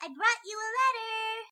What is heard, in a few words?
Derpy Txt Tone